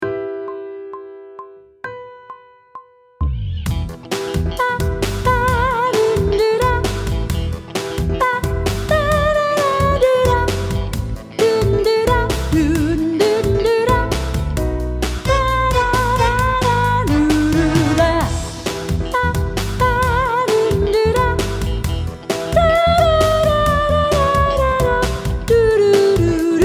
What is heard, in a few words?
Sight Singing with vocal, pop vocals; Grade 7